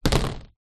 tub storage large plastic lid down; foley

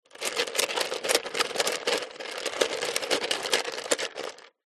foley stones small rummage plastic pot; zapsplat

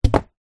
polystyrene expanded block place down on ground; foley

